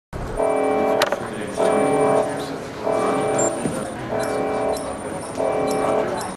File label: radiation alarm; BSG